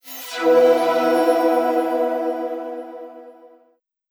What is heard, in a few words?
Puzzle Win; noahmund